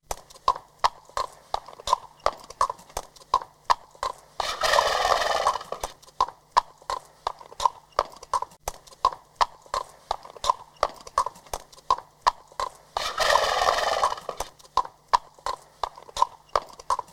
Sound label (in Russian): loshadj 3d